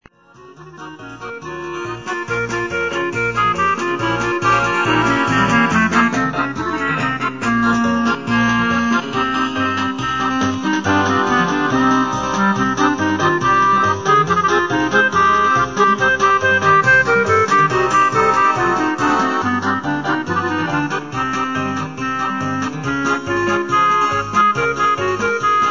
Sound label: Klezmer